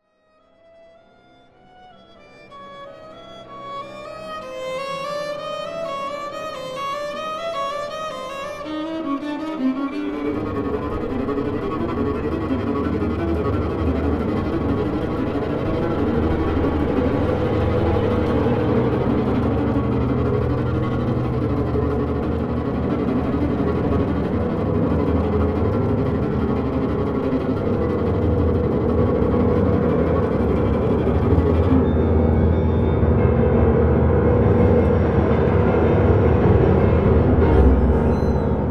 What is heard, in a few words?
Concerto for viola; Šervenikas; Barkauskas